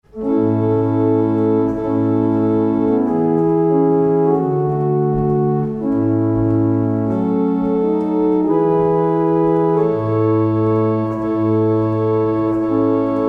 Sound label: barrel organ; Letheringsett